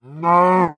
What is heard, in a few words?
hurt, cow; ayuncraft-1.5